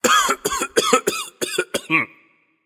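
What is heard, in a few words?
nonverbal clear throat; smite; voicepack; merlin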